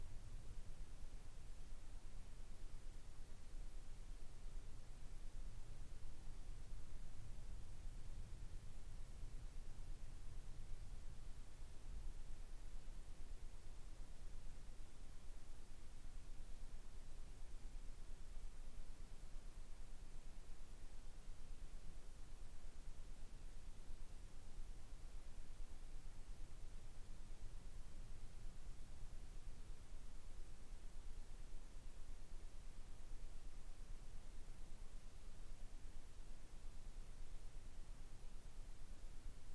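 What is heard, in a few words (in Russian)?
samoshumopodavlenie zoom h5, self noise Zoom H5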